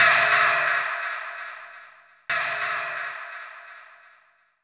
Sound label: Augusta Stab, Sound FX; Zero-G Smoke